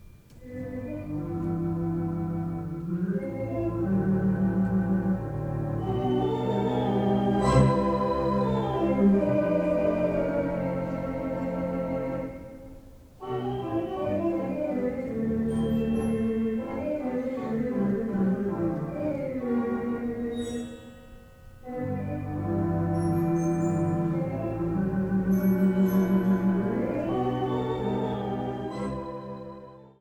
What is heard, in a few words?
Trumpet Rhapsody